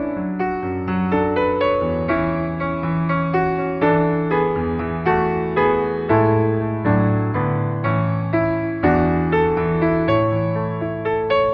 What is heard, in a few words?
piano test